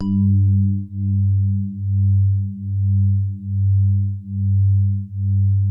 SWEETER G1, E-PIANO; Music Store - Claudius Diemeter Schwerlos

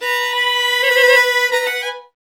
FDL, Hardng Fiddle

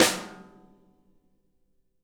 SNARE; drum

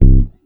HEY HEY BASS; Sampleheads - Ultra Freakin' Bass

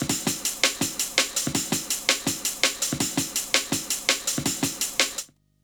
165 loop, Jungle; USB Soundscan vol.05; Explosive Jungle; AKAI; 90s sample CD

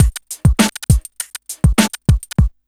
BEAT; USB Soundscan vol.31; Miro s Grooves; AKAI; MIR KIT

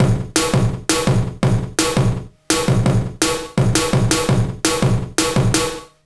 166 break, Jungle; USB Soundscan vol.05; AKAI; 90s sample CD

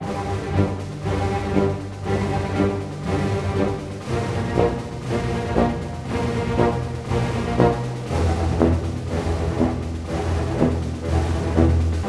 SNAR, Snare Army; East West - Scoring Tools

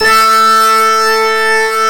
SWEEPS; NorthStar - The Wizard VOL-2; TURBOTRONIX

